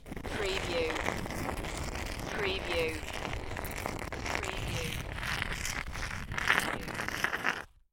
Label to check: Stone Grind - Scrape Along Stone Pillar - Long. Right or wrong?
Right